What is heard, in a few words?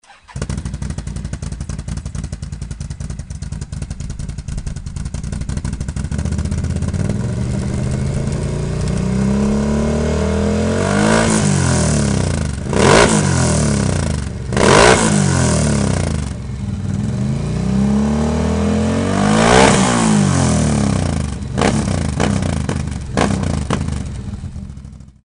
outlander 1000 swamp